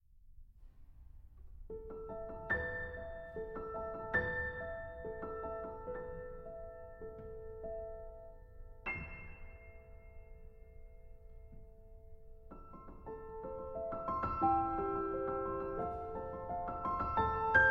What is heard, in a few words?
Arr. for Piano; Eliza Aria